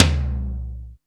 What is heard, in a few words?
TOM DRY; Back In Time Records; Big Bang